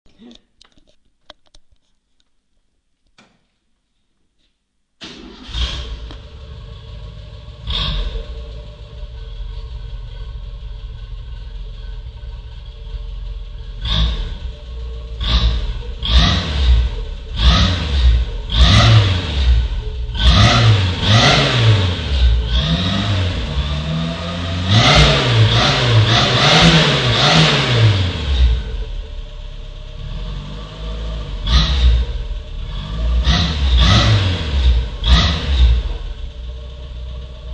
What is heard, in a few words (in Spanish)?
sonido R-8